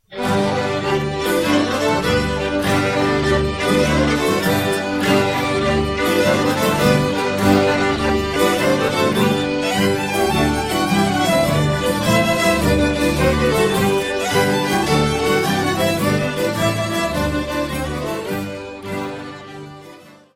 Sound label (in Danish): VALS, Valse